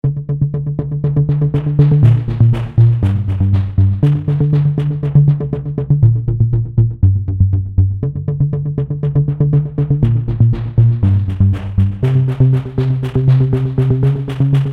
Bass RHY Macro Modulation; Heavyocity Mosaic; Rusted Pumper

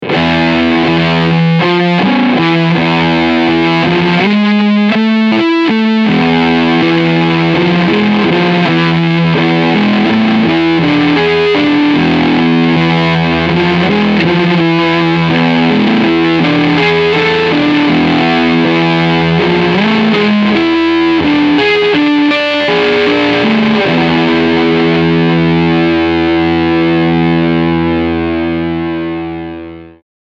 Fuzz

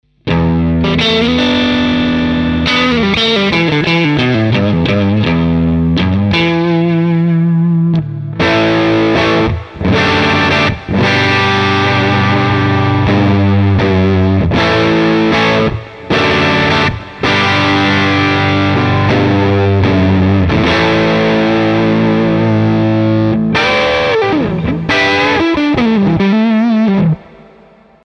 Clean Amp cranked up; Gojira Plug-In Suite

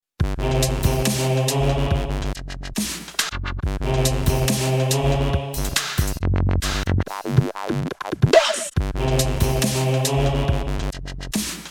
Casio XW PD1 Dubstep

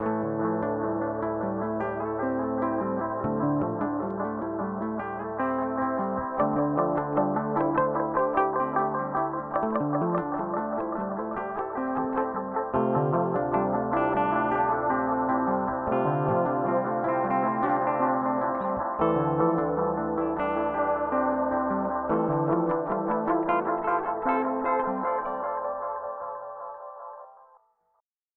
Eventide H9 Dark Delay

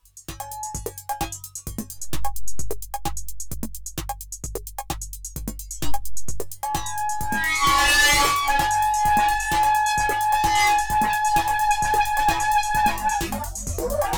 Erica Synths Black Hole DSP2 Havoc Chorus

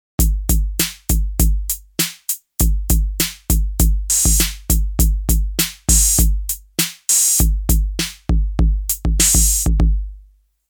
808 Tape

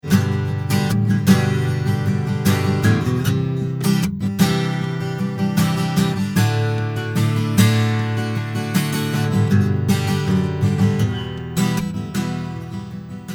Git Dry

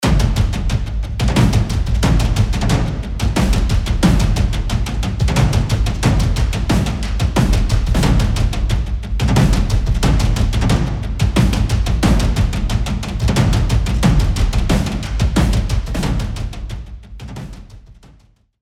Cerberus Loop 4 4 Tight

